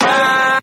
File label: "ah", STAB; ooh ahh